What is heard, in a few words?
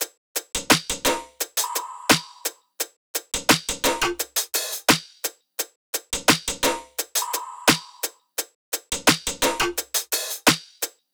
top loop, Drums, Loops, Top Loops, Pop; wierfield 86; Slate; SD PASADENA Pop Sample Pack